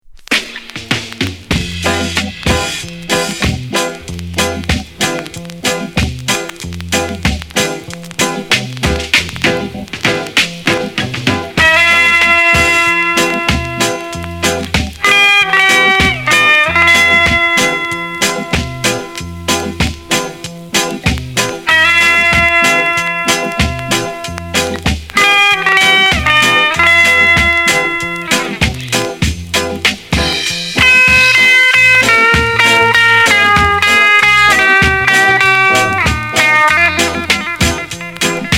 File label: DRUM SONG